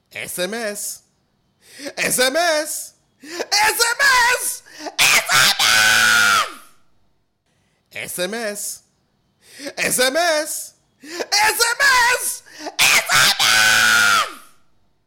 Sms Message

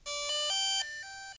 DJI Startup

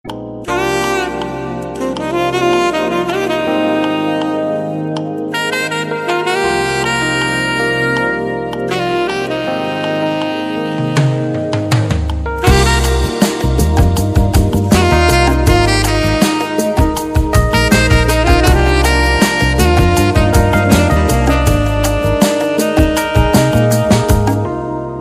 Saxophone Amazing

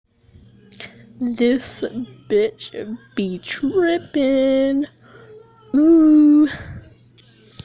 Ooo

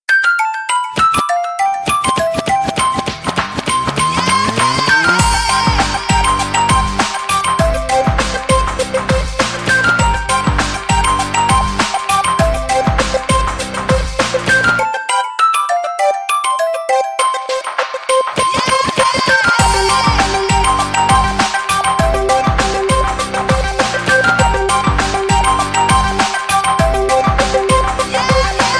Nokia Remix

